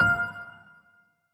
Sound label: piano; osu